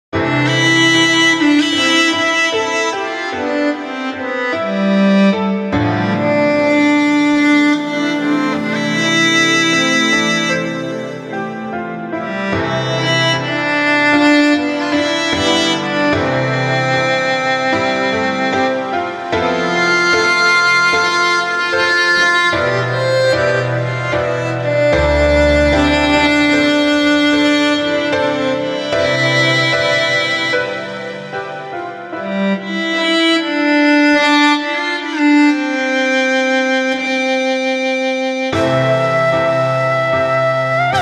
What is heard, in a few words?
Sad theme